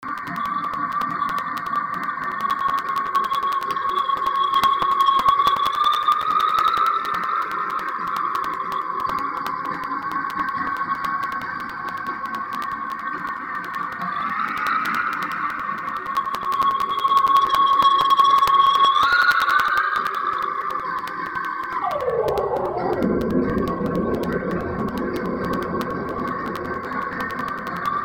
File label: Tuning In; lolicore